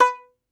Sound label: Tenor Banjo, Plucked Strings